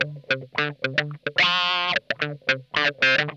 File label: Funk Guitar; Zero G - Funk Guitar